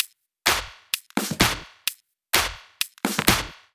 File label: Loop, 128 BPM